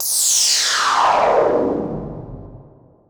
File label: Sci-Fi, Classic SFX, cartoon